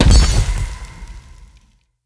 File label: paper explo